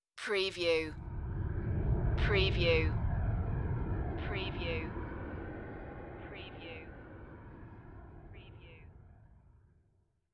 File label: Windy